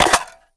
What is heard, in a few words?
reload mag out; Valorant; bulldog